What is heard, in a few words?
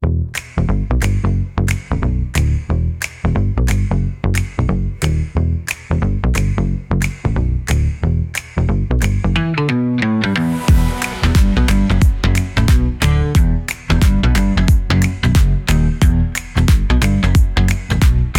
Pop